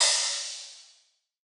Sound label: Crash, Cymbals; Cymatics; Eternity Sample Pack; Drum One Shots